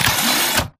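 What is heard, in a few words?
Stalker Movement Servo Head pivot Medium; Titanfall 2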